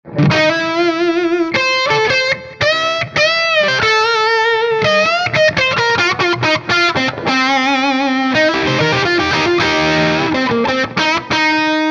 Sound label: Les Paul